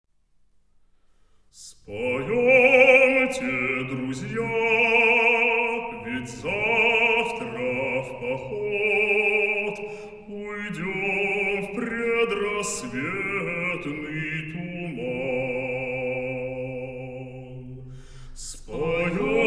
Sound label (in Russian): Хор